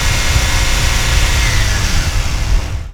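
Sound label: med constant, Engines; E-MU Producer Series Vol. 3 – Hollywood Sound Effects; Ambient Sounds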